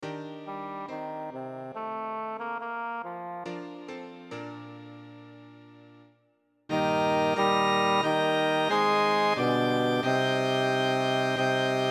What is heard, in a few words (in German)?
choral in meines herzens bass; bach johann sebastian; johannes passion